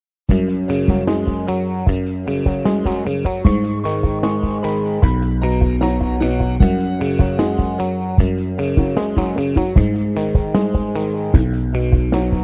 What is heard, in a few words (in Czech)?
Karaoke písnička; MP3 s melodickou linkou